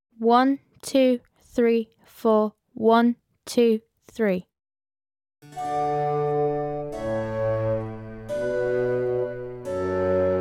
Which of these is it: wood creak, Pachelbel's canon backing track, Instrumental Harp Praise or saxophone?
Pachelbel's canon backing track